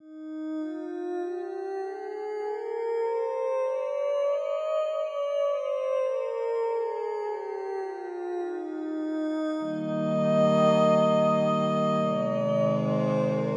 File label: 16EDO Mavila7 Studies Anti Phrygian